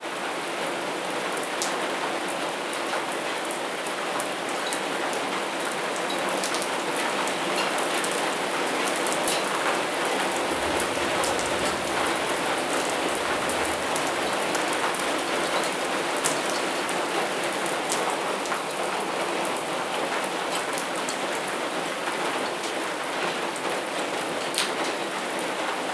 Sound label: Rain Sounds